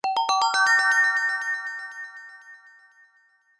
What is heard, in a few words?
Huawei Ripple